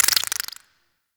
Infested Burst Rifle Mech, weapons

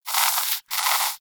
Rodent Vocal Snore; Warframe; Hunting Critters

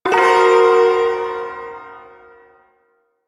Ersatz Shawzin Blues Chord; Music; Chords

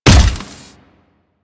Step; Warframe; Corpus; Arachnoid Wraith; Steps

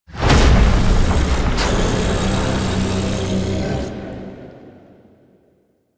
Rune Count Threshold; Warframe; Entrati; Mirror Defense

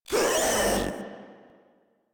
Proto Infested pain; Warframe; InfestedNinetyNine

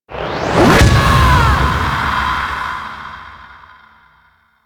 Shield Bash; Warframe; Warframes

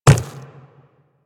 Quadruped Medium Walk Default, Footsteps

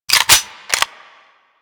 Clip In, Weapons; Dual Vipers

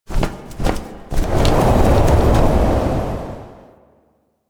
Owl Attack Start; Duviri; Animals

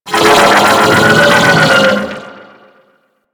Vocal Death; Amalgam Override; Warframe

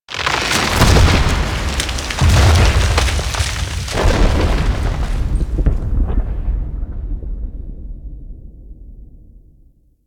Mountain Pass Mini Avalanche; Ambience; Warframe; The War Within

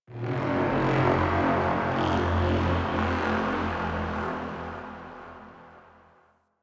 Crp Ship Energy B Texture Large; Ambience; Warframe; Corpus Ship Remaster

